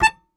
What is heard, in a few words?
Very Short Note, Melodica